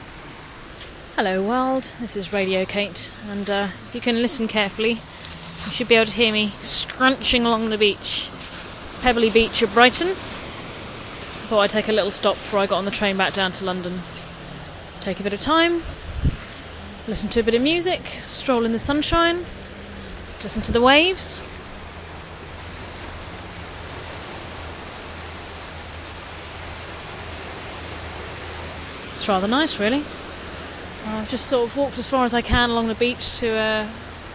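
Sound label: the lovely sounds on Brighton beach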